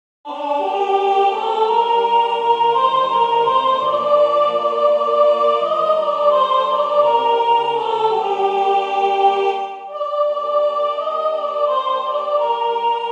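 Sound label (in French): soprane, Musique sacrée; O sainte Jeanne de Domremy; anonyme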